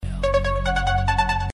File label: Decent Sms